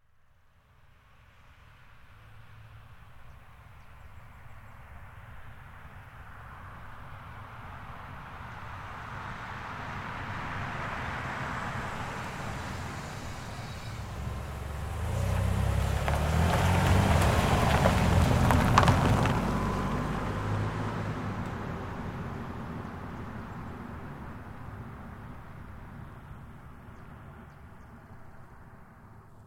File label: Car Highlander Up Stop Away Dirt Slow; 2005 Toyota Highlander V6; Cars; Vehicles